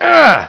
argh; wanted

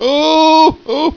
scream

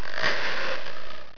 zombie idle

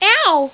"owww"; wanted

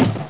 grenade hit; wanted; weapons

